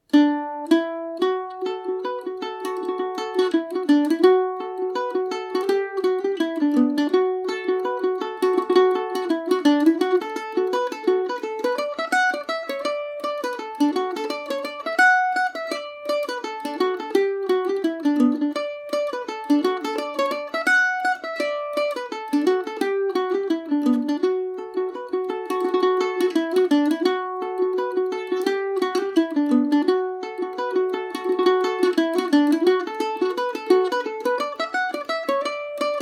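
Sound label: mountain road d major, mandolin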